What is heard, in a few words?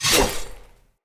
Saw Dagger Throw, Weapons; Player; Swords